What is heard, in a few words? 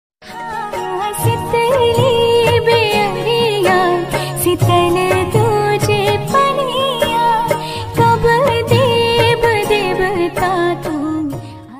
chhat puja ringtone chhat puja song